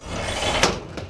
lift close; vendetta

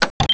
laptop off; vendetta

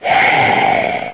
skeleton pain; custom monsters; evoskeleton

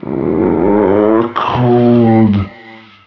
attack, evoskeleton; custom monsters

